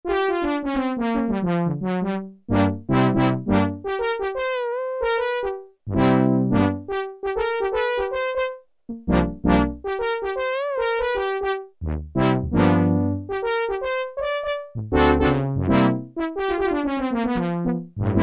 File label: DEM BONES, Oberheim OB-8; Factory Patches